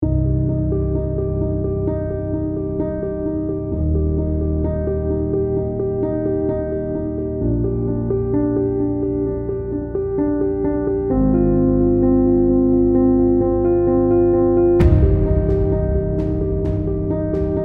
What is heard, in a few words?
epic orchestral bpm65; archibald editions